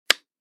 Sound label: movie clapper